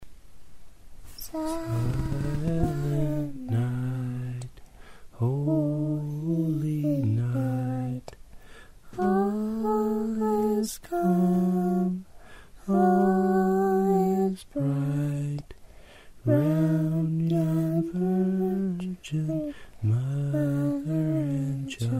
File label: Singing Silent Night